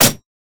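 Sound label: siege rider basic attack; minions; LoL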